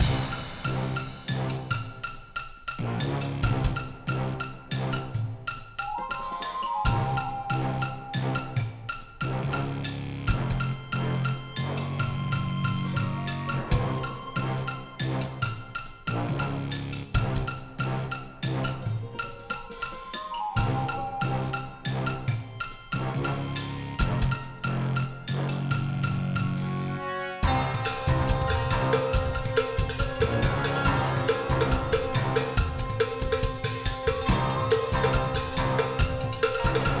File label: ACTION 140BPM; CAVES OF DESPAIR; The LEGO Ninjago Movie Video Game; PS4; Windows; Xbox One